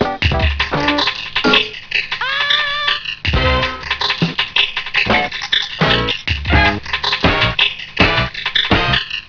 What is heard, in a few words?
dj loop